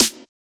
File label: snare